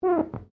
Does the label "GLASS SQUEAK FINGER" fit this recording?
Yes